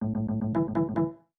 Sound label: Hardware Insert